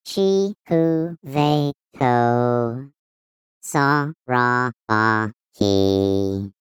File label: Demo melody child